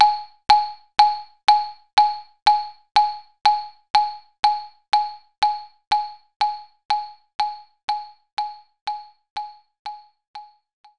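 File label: Xylophone G sharp 4; knights try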